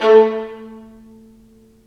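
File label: violins marcato, String Sections; Roland LCDP13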